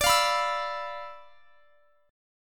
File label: D Minor 6th Add 9th; Dm6add9 strummed; JGuitar